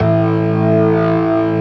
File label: STOPS; InVision Interactive; Keith Emerson; ALL STOPS 1